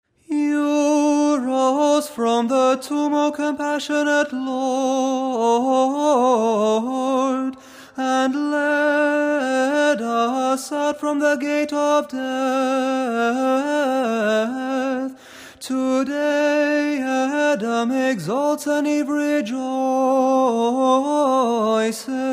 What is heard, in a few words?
Tone 6 kontakion